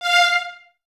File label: Violins Detache F 5; Miroslav Vitous; String Ensembles